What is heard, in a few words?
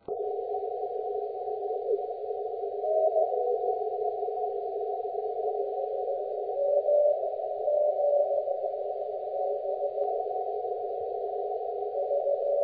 beacon 6m cw